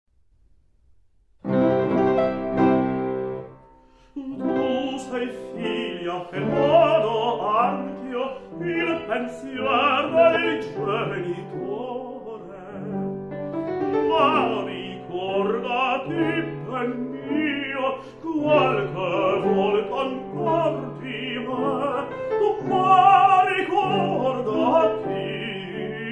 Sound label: For Soprano And Piano; Glinka; P.Metastasio